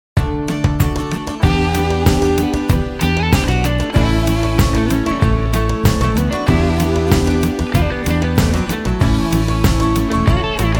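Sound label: Jingle With Melody; Babylon Honda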